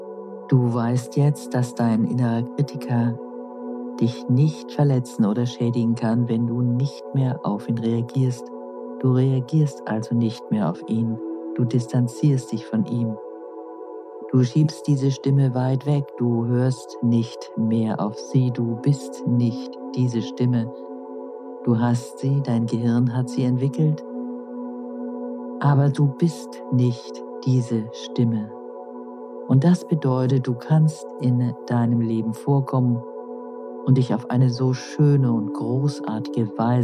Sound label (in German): Musik; wenn trauma dick macht